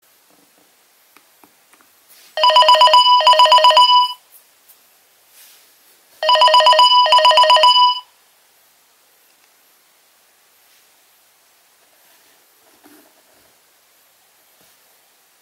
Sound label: Nokia 2300 Ringtones